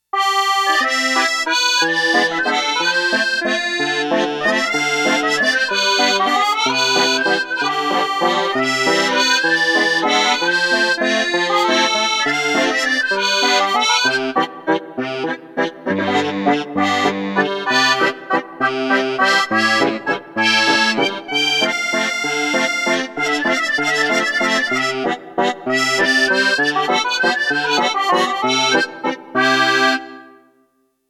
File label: ACCORDION, Roland JX-1; Factory presets